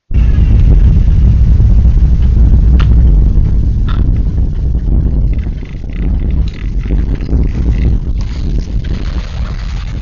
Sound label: midnight thunderstorm